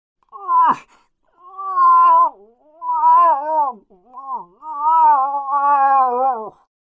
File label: Moose Cow